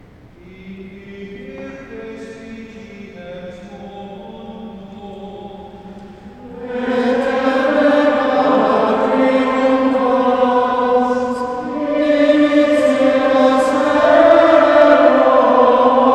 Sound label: Magnificat antiphon